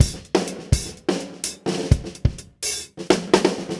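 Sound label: 126 bpm, beat loops; Zero-G; Monster Beats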